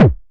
punch

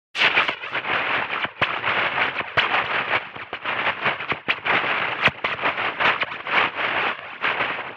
strange waves

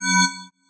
beam

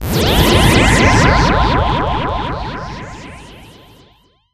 warp

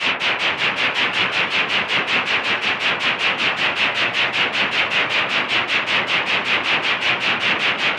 steam locomotive

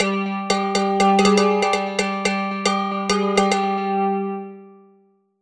stainless bottle rolling down

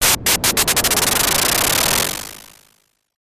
spin

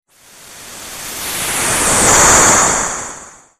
land the spaceship

